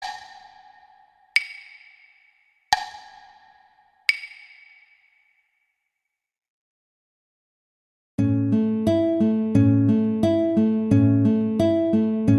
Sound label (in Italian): Quasi Adagio Violin; Paganini; Sonatas Op1 Vl Gu; Sonata No 5